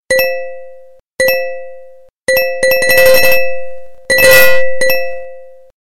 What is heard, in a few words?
Ping